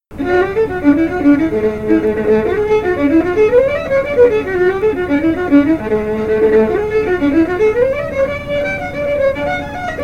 irish girl reel fiddle; tune tutor; SeamusGrantFestival

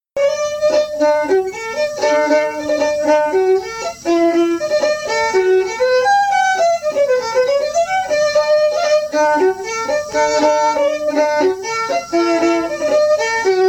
my love is but a lassie fiddle; tune tutor; SeamusGrantFestival

